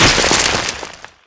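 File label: destruction bag beans; Explosions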